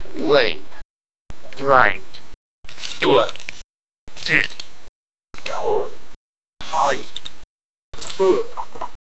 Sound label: points being counted up, tally